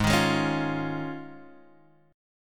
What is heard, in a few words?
F Minor 7th Sharp 5th G sharp